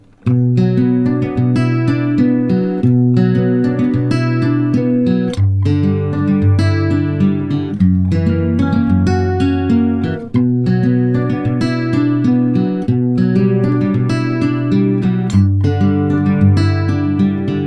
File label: Fingers chorus